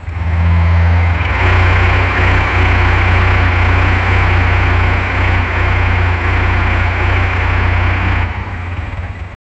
vrombissement d'un moteur; electric

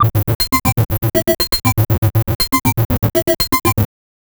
un court jingle un rythme, jingle

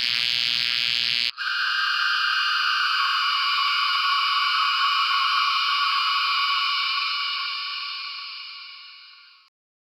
gonflement d'une batterie; devices

